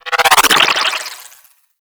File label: Flak Drone Projectile By; Corpus